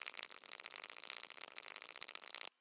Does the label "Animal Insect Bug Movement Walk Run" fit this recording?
Yes